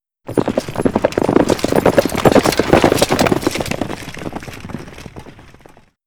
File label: Gallop; Inquisitor 2